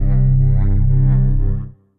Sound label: Gently Pulsing Bass Drone; Instruments; Brooding Bass; Ableton; Mood Reel